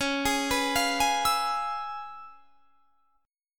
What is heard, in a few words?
Db 7th Sharp 9th Db down, chord shape; Db7#9 arpeggiated